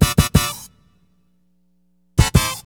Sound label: Horns Section